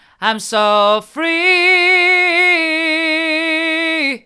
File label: hard, vocal